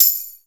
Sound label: Tambourine